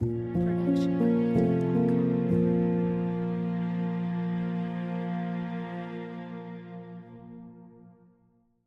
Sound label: Mystery Piano; soundscrate